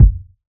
hot kickdrum sound g key, kick drum single hit tuned to the G note